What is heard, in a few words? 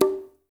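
conga, right; Spectrasonics; Liquid Grooves 1 & 2; CONGAS+BGOS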